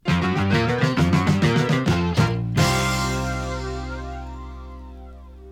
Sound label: topformat maxi jingle single